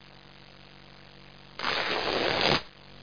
rip; Broderbund Print Shop Multimedia Organizer Deluxe Edition

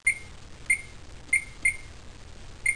Microwave Oven

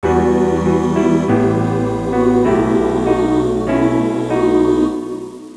piano

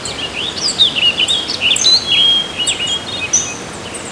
tram; NFS High Stakes

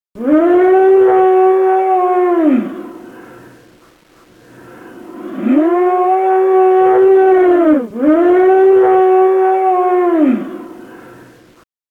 Scream Low

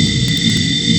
gravity burst; axis

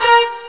trumpet; Total Irrelevance 3

